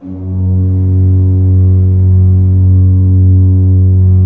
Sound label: F1, Cathedral Organ; Propeller Island